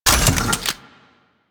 Heavy Grenade Launcher Reload; Warframe; Archwing

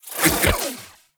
Archon Whip Spin Whoosh; Enemies; Weapons; Warframe; The New War; Nira

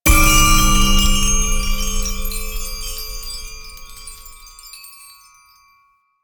Chime Hit; Warframe; Duviri; Easter Eggs

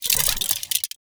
Sentient Metallic Move; Sentient Movement

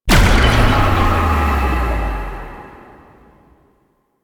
Apparition Spectral Impact; Warframe; Warframes; Dagath

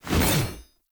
Two Handed Axe Whoosh; Weapons; Enemy; Axes; Sinecure Two Handed Axe

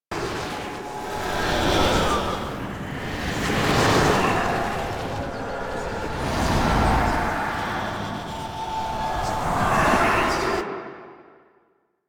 Quest Portal Emerge Loop; Duviri; Undercroft Tutorial; Ambience

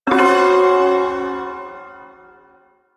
Ersatz Shawzin Hirajoshi Chord; Duviri

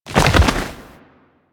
Roll Forward Foley E; Enemies; Warframe; Teshin; DE

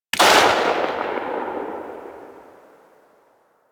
Lever Action Rifle Tail, Weapons; Warframe; Tenno

